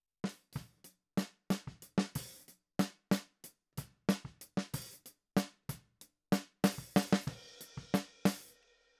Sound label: Snare